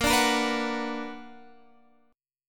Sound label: B Minor Major 7th